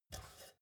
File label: step ladder metal grip single; schritte